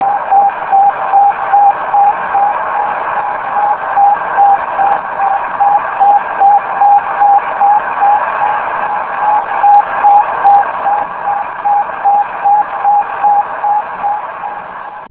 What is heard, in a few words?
bipbip